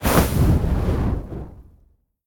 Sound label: idle flame circle; Smite; Susano